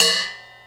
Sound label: Ride Choke One-Shot, cymbal; Drum n Bass; One-Shots; KJ Sawka; Drum n Bass & Beyond; Drum Tool Box